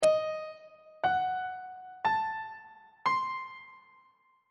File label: e harmonic minor 7th chord 7 is d sharp diminished 7th on treble clef